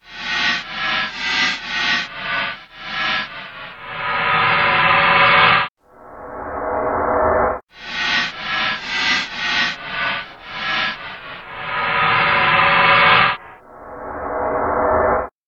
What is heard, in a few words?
reverse cymbal